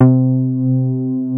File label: MOOG BASS C4; Best Service Dream Experience